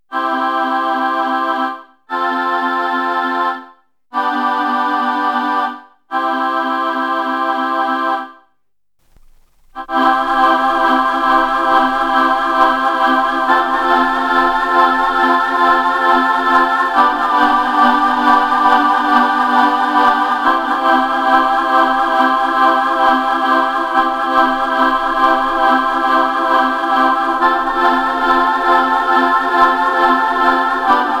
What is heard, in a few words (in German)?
Casio Chor trocken dann Tremolo; FieldKitFX